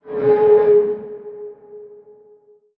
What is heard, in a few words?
explosive objects; World of Tanks